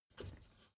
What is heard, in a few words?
step ladder wood grip single; schritte